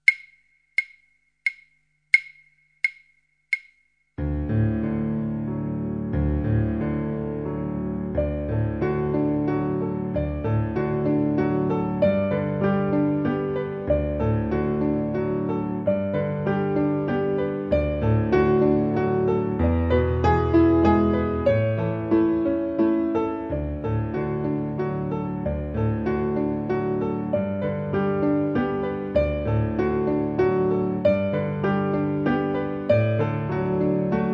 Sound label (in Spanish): En el viento piano